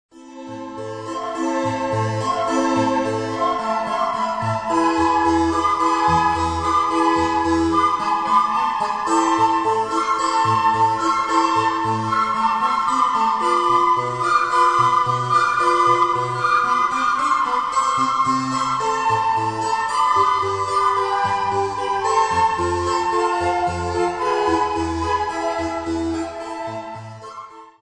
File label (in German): Kuckuck, floetenchor